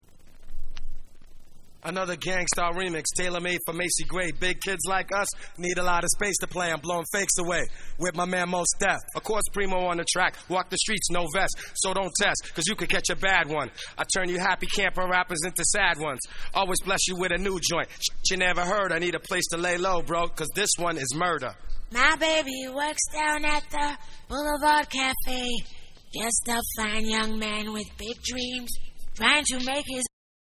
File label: Acapella